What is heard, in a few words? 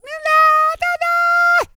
SING, World Voices, CARIBBEAN